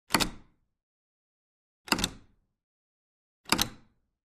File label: Door latch opens, closes